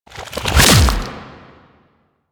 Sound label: Octopede Limb Attach; Warframe; Man In The Wall